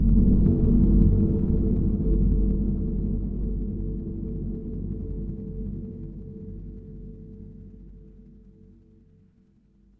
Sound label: horror ambience dark